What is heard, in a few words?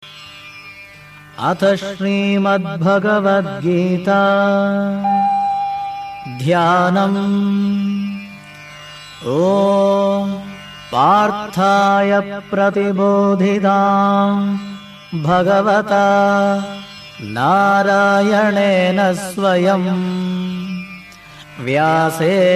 gita chanting